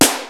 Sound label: RY-10, DRUMS + PERC, YAMAHA